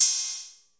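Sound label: CR8K cymbal, Roland CR-8000 drum machine; DRUM MACHINES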